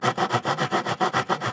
Saw Wood Loop; Fantasy Sounds Bundle; Craft

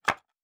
Wood Impact; Fantasy Sounds Bundle; Craft

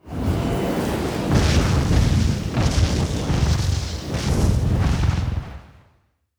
Fire Spell, Magic Sounds; Fantasy Sounds Bundle

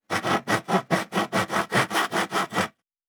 Saw Wood; Fantasy Sounds Bundle; Craft

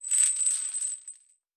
Chain; Fantasy Sounds Bundle; Craft

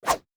Whoosh; Fantasy Sounds Bundle

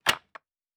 Wood Debris; Fantasy Sounds Bundle; Craft

